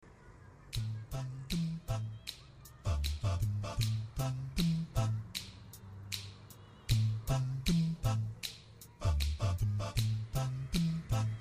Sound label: Rhythm bgm